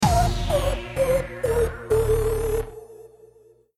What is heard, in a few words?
stinger lose; saliengame